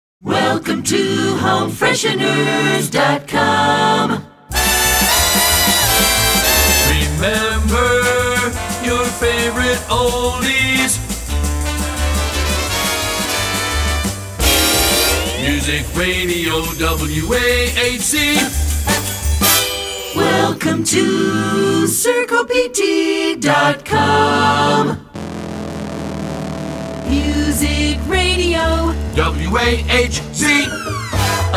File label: Jingle; Short Attention Jingle Show